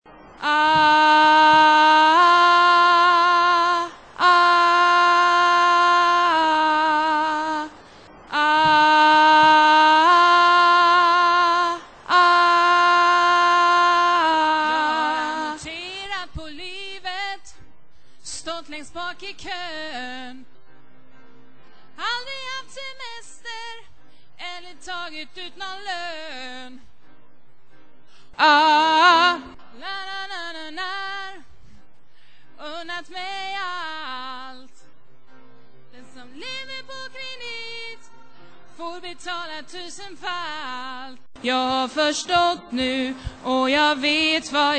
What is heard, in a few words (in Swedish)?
vingar alt, stämmor